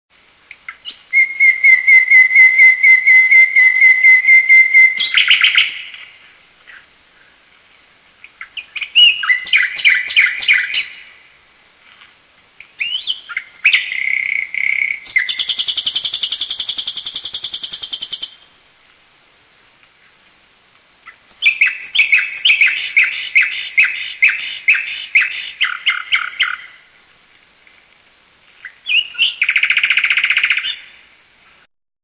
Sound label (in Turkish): Bulbul Kanarya Sesleri, Telefon Zil Sesi